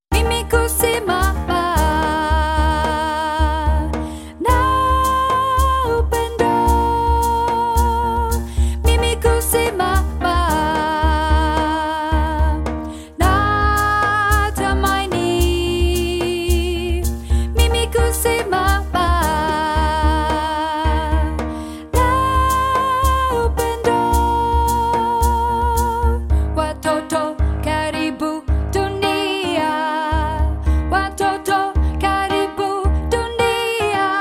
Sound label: Kusimama chorus; part 1; young voices